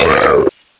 Deer Pain

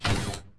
My Throw Target

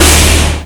Salad Alt Fire

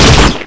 Bio Rifle

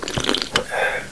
beer gulp; Monster Hunt

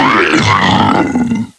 Jack Death; Jack Frost

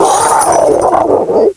Deer Death

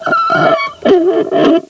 Stalker Attack

